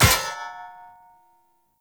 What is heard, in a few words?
Short Sword Medium Parry Impact, Weapon Impacts; Player; Swords; Combat